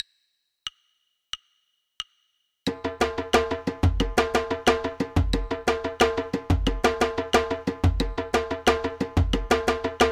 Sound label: Funk Groove Timba 90